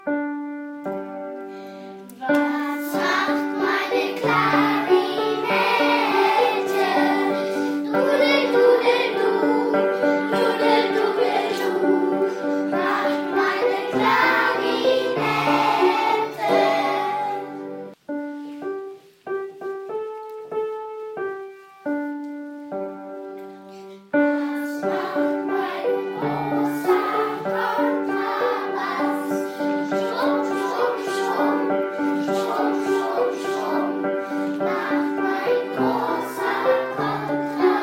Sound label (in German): Instrumente